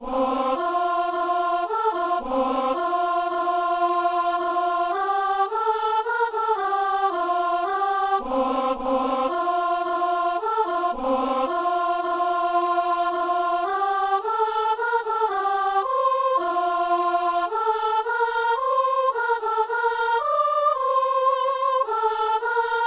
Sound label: soprano; Il est né, le divin enfant